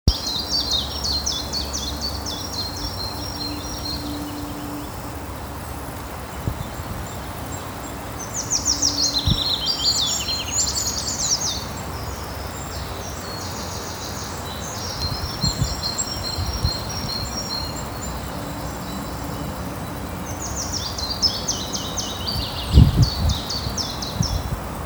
fitis

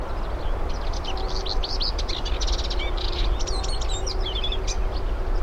weissbart-grasmuecke

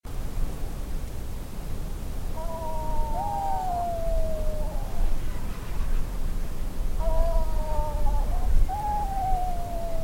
madeirasturmvogel

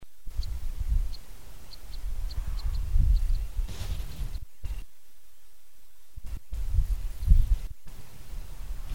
bindenkreuzschnabel

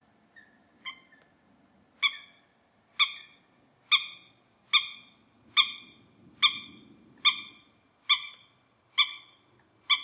blaesshuhn

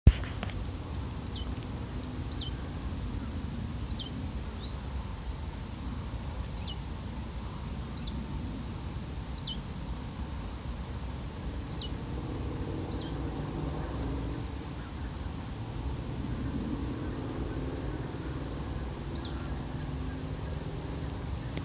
zilpzalp

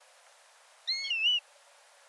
kiebitzregenpfeifer

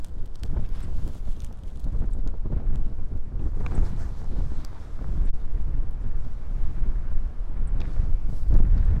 maskenammer

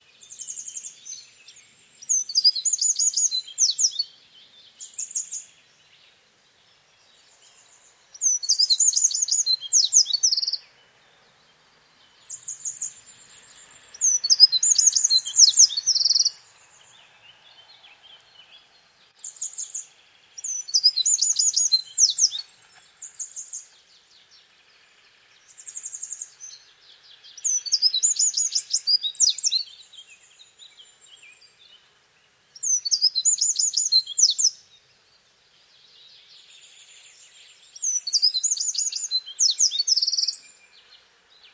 gruenlaubsaenger

